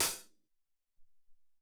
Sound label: Rm Hat Md, Alesis SR-18